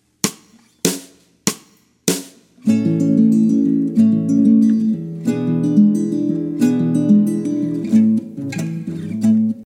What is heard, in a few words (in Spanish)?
2 guitarras con clik